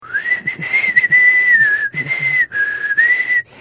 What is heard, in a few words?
whistling